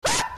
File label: Scream